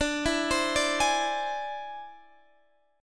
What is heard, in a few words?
E Augmented 7th D